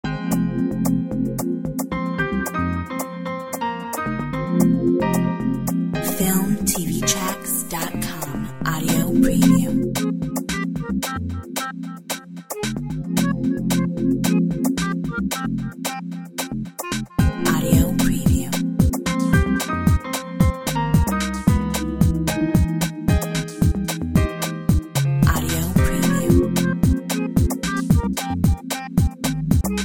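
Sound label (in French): valse de noel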